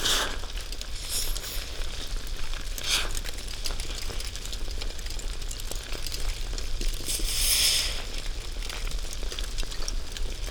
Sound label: brething magma